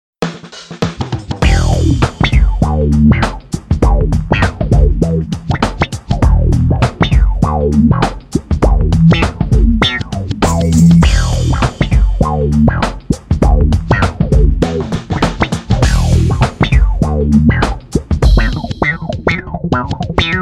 me-20b patch; ME-20B Bank 2, No. 1; Funk sound, great for slap bass.

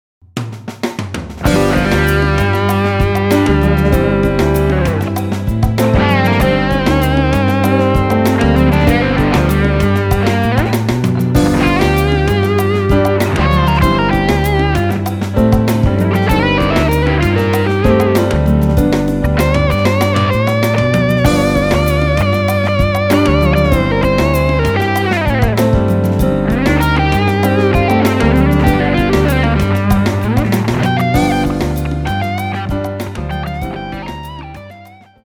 latin lead

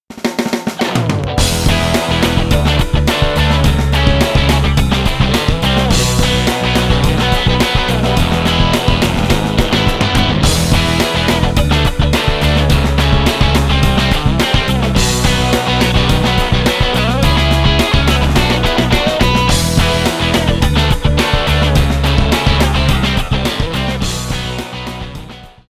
patch demo

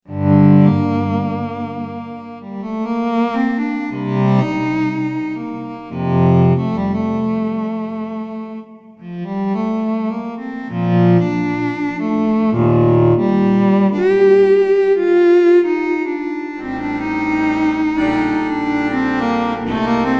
articulation cello

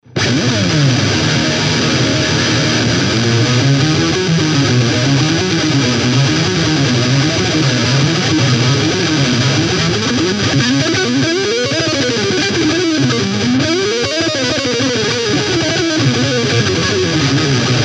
extreme heavy octave; cube-20gx

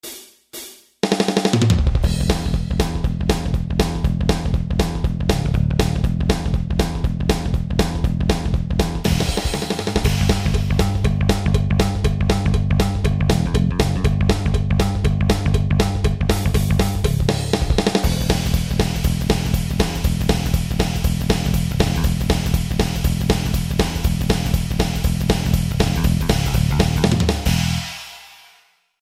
fast rock

